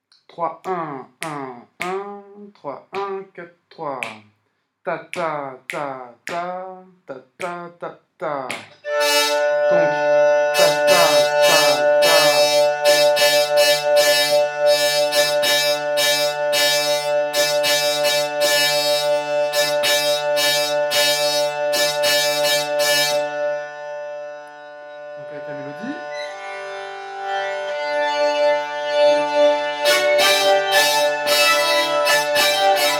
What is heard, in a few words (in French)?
Chien sur bourrée à 2 tps - seul puis avec mélodie; Bourrées 2 tps